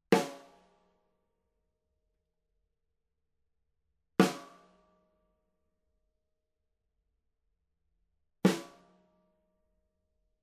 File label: Snare Overhead